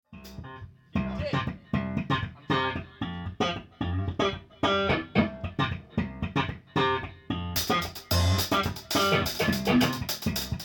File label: Broken Funk